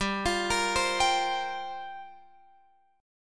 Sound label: G Minor 11th G down Guitar Open F; Gm11 arpeggiated